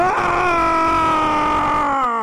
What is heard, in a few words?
scream; dr eggman; hilarious